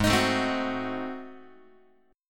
G sharp 7th Flat 9th, Guitar; strummed; chord; x,4,6,7,4,5

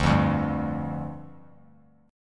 D-Minor Double Flat 5th, D, Bass Standard; Dmbb5; strummed; JGuitar

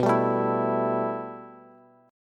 B 7th Suspended 4th Sharp 5th B down Bass; B7sus4#5 strummed; chord shape; JGuitar

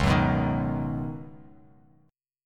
Db 9th Suspended 4th Db down; strummed; JGuitar

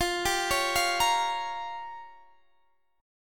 Db 6th Flat 5th

